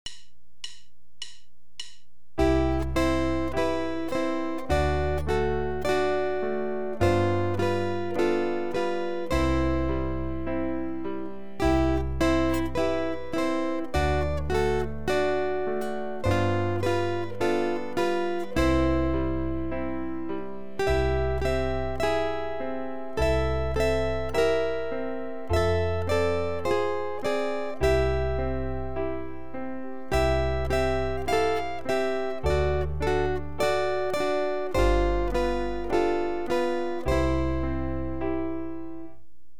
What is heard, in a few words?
Aura Lee, guitar